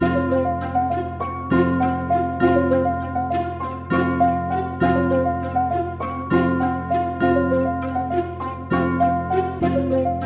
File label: 200 Bpm F Phrygian, loops; Detroit Drumkit And MIDI Files ''Hard Times''; Dark Night